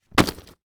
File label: Sports Bag Duffle Bag Drop Cement Floor